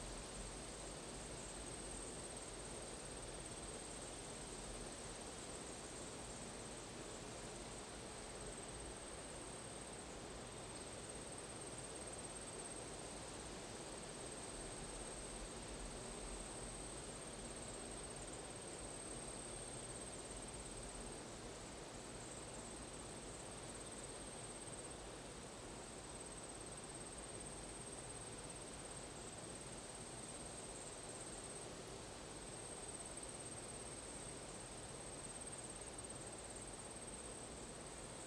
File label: outdoor forest